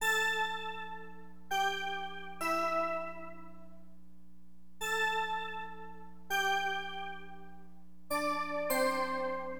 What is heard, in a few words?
BELLS, Keyboards; LiveAct Pool; AcidJazz Funk